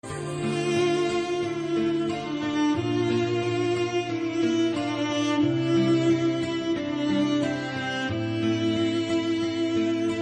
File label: Violin Love